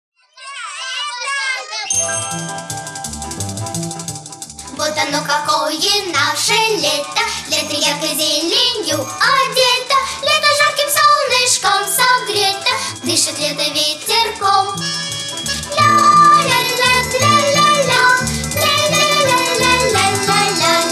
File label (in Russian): Детский Хор